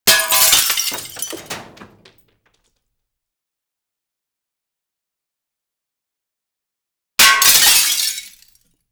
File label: glass med drop pane; Asian Show Sound Effects